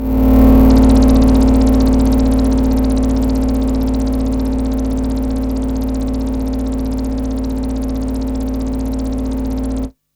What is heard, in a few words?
NOISE FX